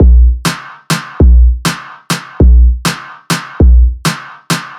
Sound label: Bass Kick and Snare